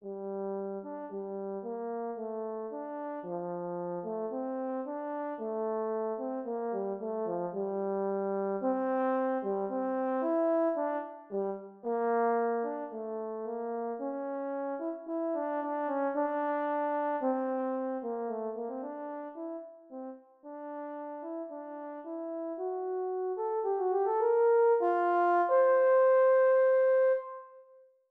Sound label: Horn